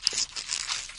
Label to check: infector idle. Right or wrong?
Right